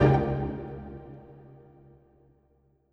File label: Strings Hit Pizzicato